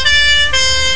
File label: Ambulance; devkitPro; nds; maxmod